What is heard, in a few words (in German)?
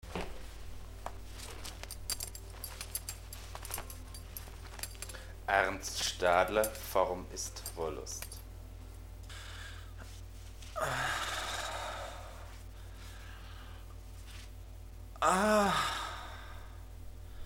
sprache orch jazz